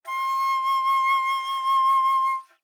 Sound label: Alto Flute vib ff C6 stereo; Woodwinds